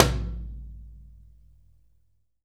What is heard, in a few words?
tom, drum